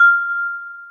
celesta, programmable speaker

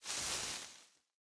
bush big, walking, plant; Factorio

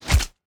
axe meat; Factorio

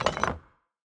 deconstruct large; Factorio

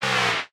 robot die vox; fight

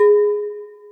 celesta, programmable speaker

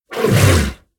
worm roar short

